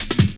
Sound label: Boom Cut, Drum Loops; Jungle; Boom 161.5; Zero-G Jungle Warfare Complete